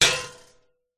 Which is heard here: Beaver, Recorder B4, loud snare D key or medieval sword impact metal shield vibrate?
medieval sword impact metal shield vibrate